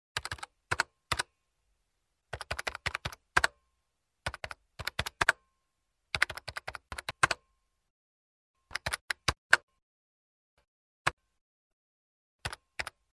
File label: Typing on Keyboard